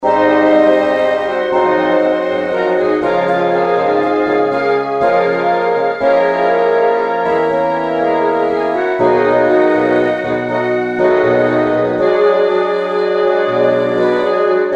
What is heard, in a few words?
pump organ